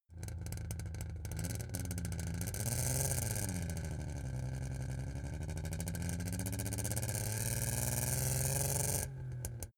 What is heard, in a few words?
car saab 92 accelerate single gear exhaust